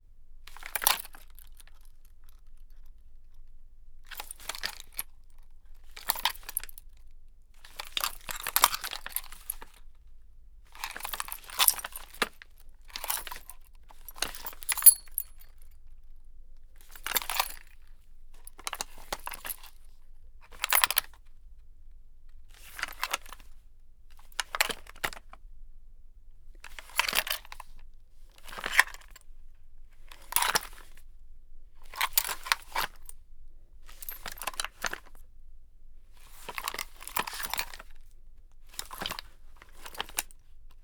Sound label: MOSIN-NAGANT movement handling; FLYSOUND